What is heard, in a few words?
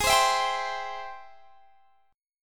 G sharp Augmented Major 7th G sharp; G#+M7; strummed; x,23,22,21,20,20